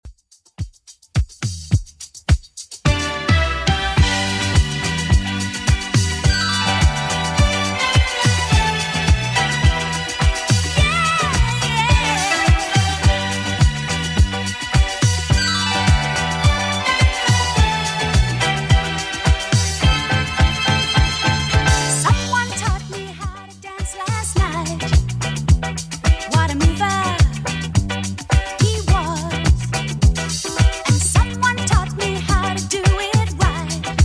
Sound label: 70s Redrum 106bpm